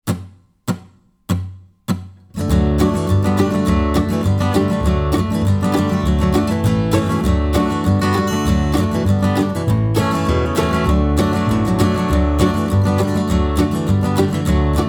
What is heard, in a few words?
Backing Track, guitar; guitar lesson; Fishers Hornpipe